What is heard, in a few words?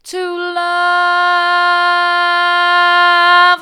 to love hard, vocal